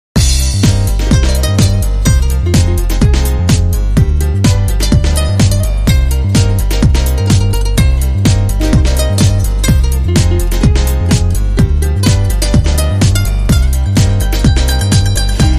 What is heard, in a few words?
Cuban Dance No Vox